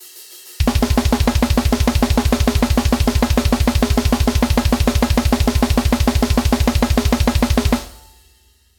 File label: basic blast